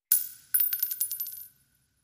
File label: Shell Casings Single; shell casing movement